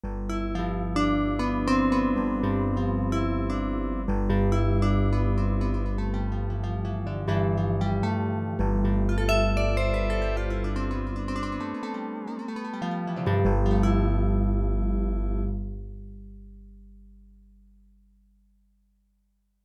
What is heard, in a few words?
UNISON VIBES & GTR; Yamaha TX16W; Jazz Performance